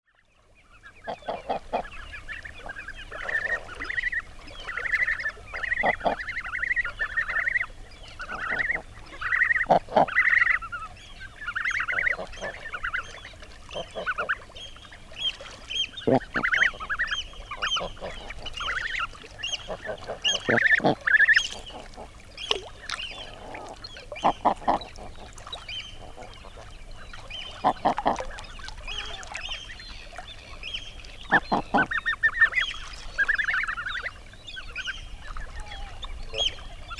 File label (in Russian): obyknovennaya gaga golos